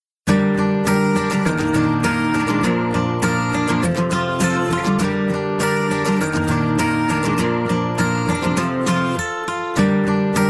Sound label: guitar acoustic guitar music tone ringtone